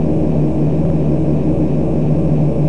motor, Train; OpenBVE

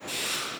air, Train, R62 Kawasaki; OpenBVE

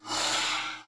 Air, Train; OpenBVE

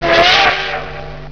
klaxon, Train

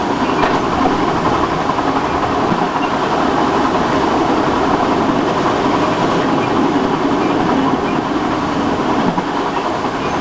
Run, Train; OpenBVE; Cl313 Silverlink

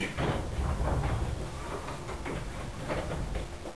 Door Open, Train; OpenBVE

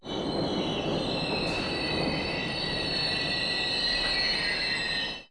motor, Train; OpenBVE

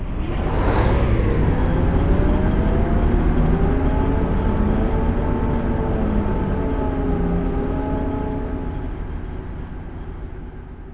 engine fire, Train cl158; OpenBVE

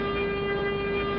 Motor, Train; OpenBVE

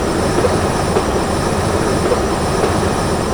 Run, Train; OpenBVE

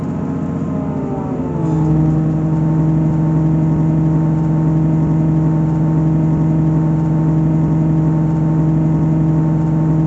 motor, Train cl158; OpenBVE

